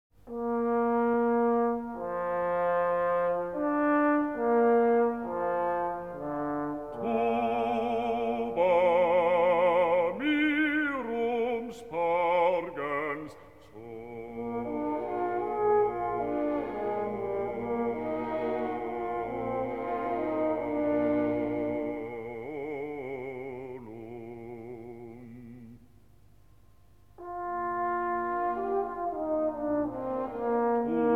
Requiem in D Minor, K 626 IV. Sequentia Tuba mirum; Mozart, Wolfgang Amadeus